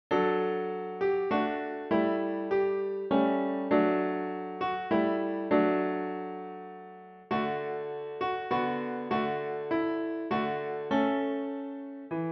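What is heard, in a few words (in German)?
Süßer die Glocken nie klingen Klavier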